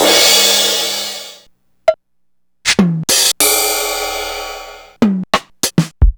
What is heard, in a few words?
drumtraks, Sliced